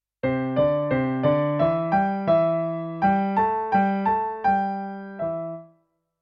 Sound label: pentatonika; primer